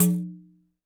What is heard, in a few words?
percussion, Roland TD-20